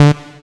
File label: SYN POLY, JD 800